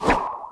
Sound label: attack; Fly For Insanity